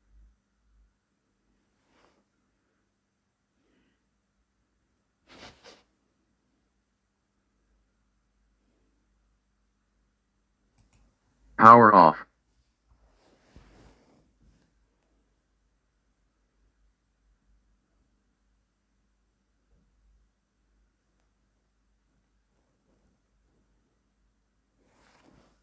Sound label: power off